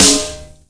subtle reverb snare single hit D# key, Royality free snare sound tuned to the D# note.; snare drum samples